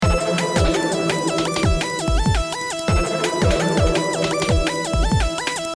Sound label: Noise Lead